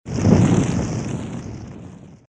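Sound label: short flash of fire; Короткие звуки для монтажа; transition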